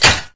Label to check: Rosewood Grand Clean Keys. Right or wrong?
Wrong